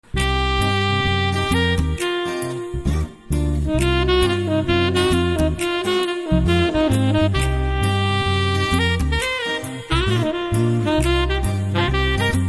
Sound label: Awesome BGM Ringtone; Aadavallu Meeku Joharlu